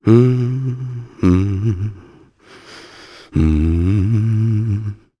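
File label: Vox Hum Japanese; Nicx; kings raid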